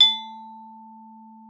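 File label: xylophone A4; Xsample VOL-4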